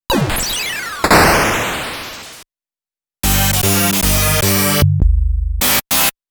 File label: Miss ~ Game Over